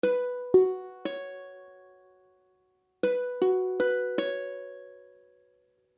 Hsus2, akordy ukulele

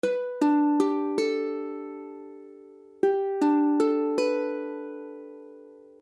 Gadd9, akordy ukulele

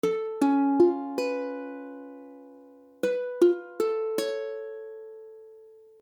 Cis7is5, akordy ukulele; C#7#5; C# akordy (=Db akordy)

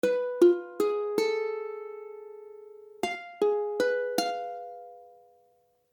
Fdim, akordy ukulele

